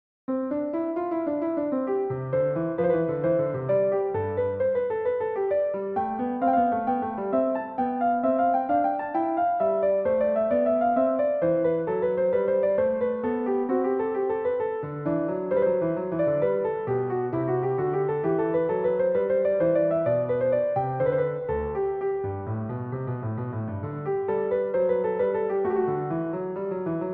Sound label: invention in C major piano; bwv 772a